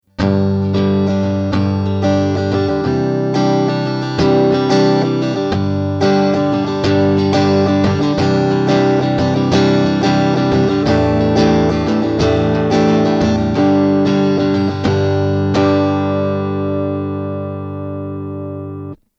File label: strumming